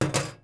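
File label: metal clank small; objects; Star Wars Obi-Wan; XBOX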